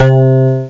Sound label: piano elec; Amiga Special - Software Hits; orchestra; keystring